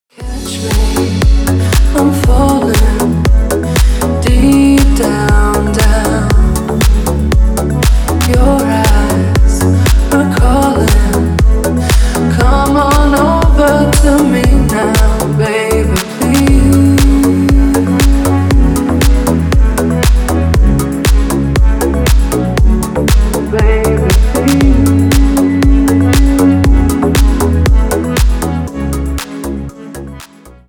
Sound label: deep house remix